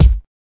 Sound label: lofi kick; Kicks; single hits